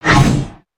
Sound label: Sorcerer Slash; Valiant; aronwk collection; ndaudio